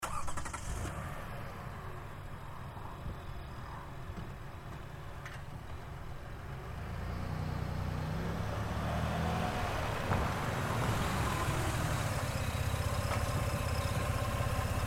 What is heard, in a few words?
Car Highlander 3PT Moves; 2005 Toyota Highlander V6; 3 Point Turns; Vehicles; Big Room Sound